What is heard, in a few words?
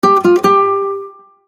Tremble; PlusFourSix; Sania; Lars Edvall